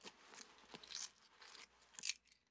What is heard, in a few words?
Hands In Pocket Touching; Fairlight Sound Lib